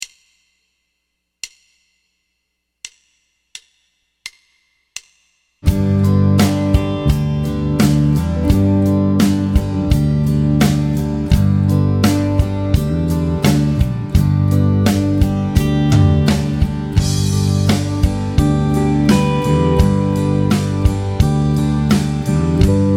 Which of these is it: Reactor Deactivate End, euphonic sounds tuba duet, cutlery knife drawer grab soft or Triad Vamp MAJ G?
Triad Vamp MAJ G